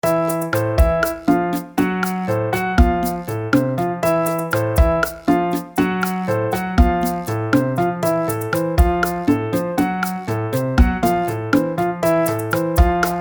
2 speeds

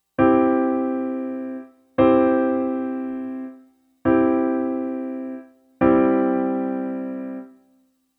Adding 4th note to triad

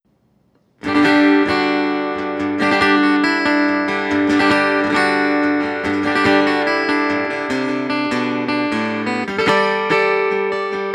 Yamaha FGX5 Blend

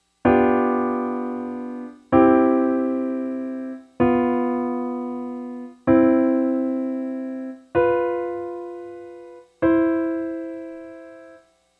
Dominant 7th resolution

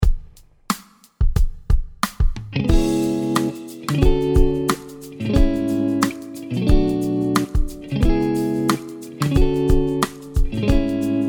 C Phrygian Tonal Center; guitars; key centers vs tonal centers

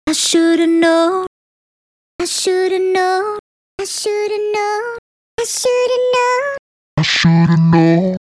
Vocal Chop

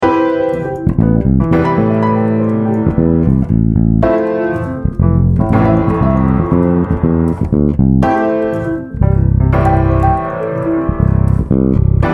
Aminor, a v chord for the VII; bass; a bassist's guide to chord substitutions

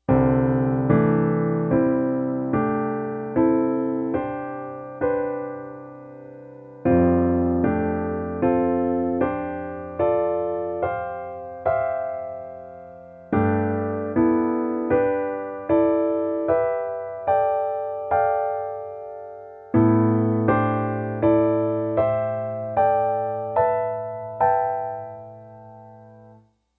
quartal voicings

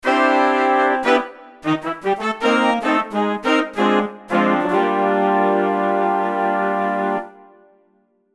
Big Band blend

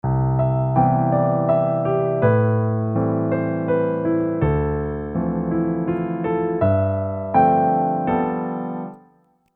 2 hands

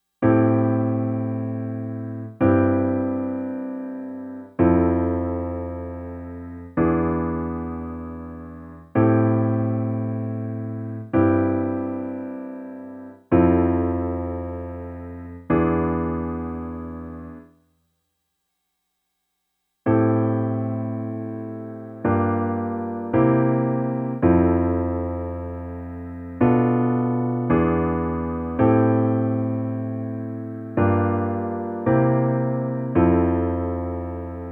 Pop tune adding ii V I

